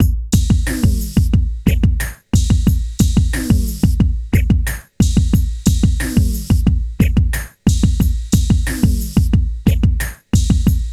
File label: techno; INIS; Funky Xtreams